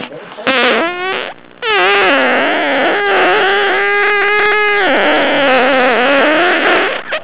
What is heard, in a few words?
the grinder